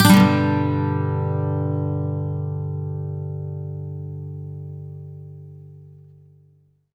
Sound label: MAJ SWEEP G, Electric & Acoustic Guitar Loops; SWEEPS; USB Soundscan vol.04; AKAI